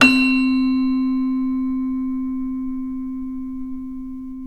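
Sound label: CELESTA D2, Synclavier Percussion; ILIO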